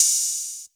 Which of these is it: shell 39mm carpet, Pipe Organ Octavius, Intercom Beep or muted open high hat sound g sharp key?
muted open high hat sound g sharp key